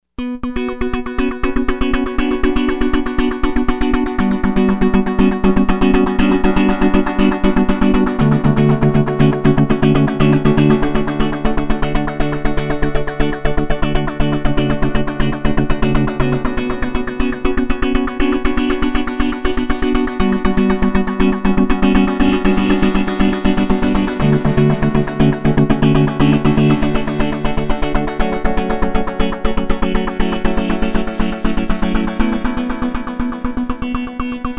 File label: Simple Chord Arp, Novation XioSynth; Novation XioSynth 25